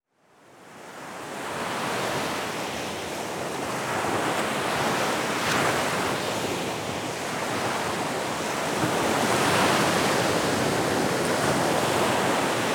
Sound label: Ocean Black Sea Waves